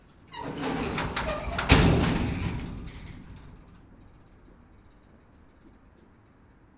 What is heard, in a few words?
Garage Door Closing